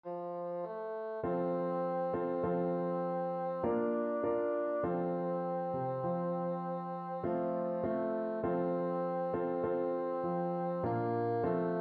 kumbayah, bassoon

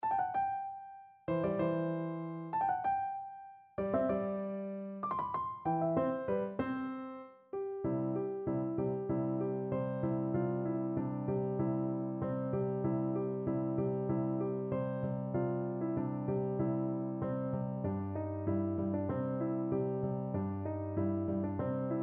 schubert schmetterling, piano

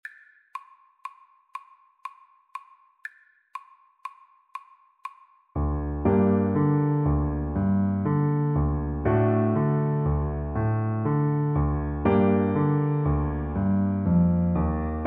Clarinet

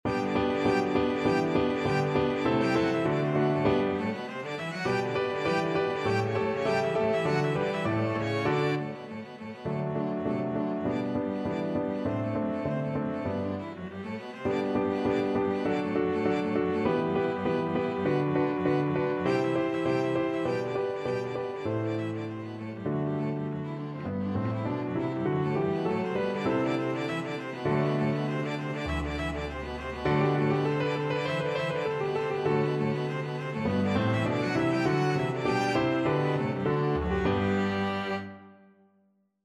flexible unison

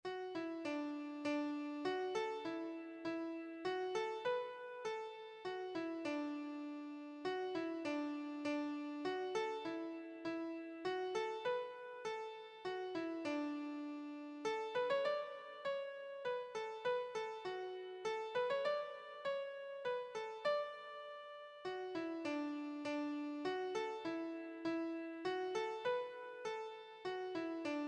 come thou fount, keyboard

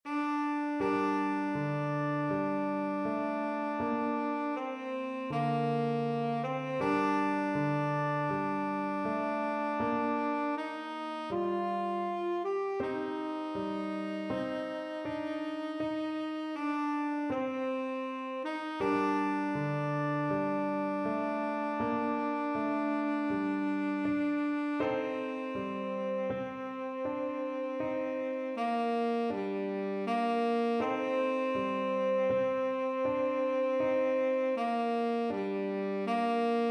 tenor saxophone

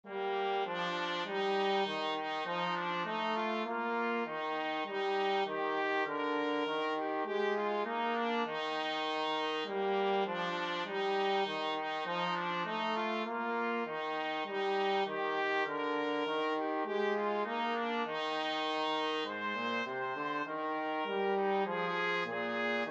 trumpet trombone duet

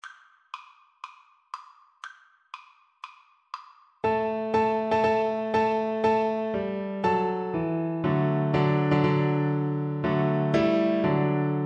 wedding march, Double Bass

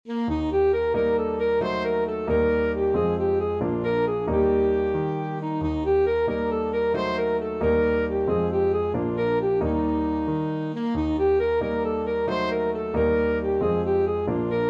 ASAX, saxophone

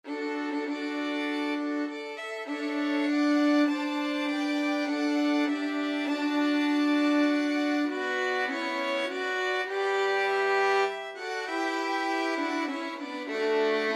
violin ensemble

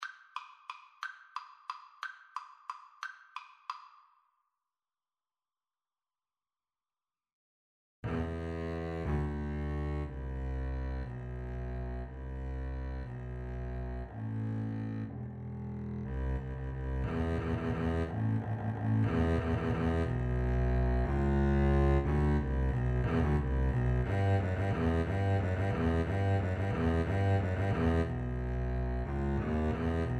Double Bass Duet

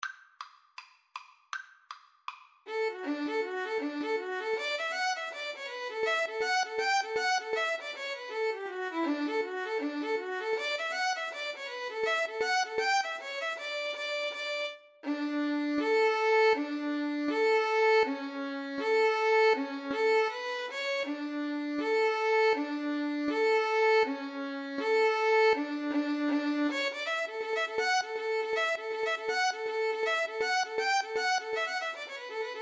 the harvest home, Violin Cello Duet

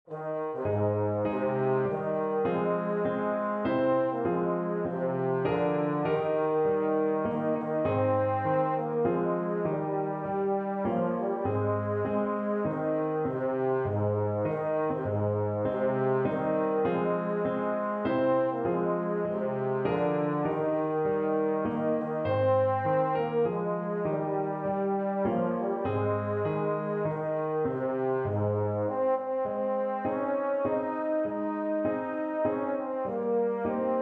EUPH, euphonium

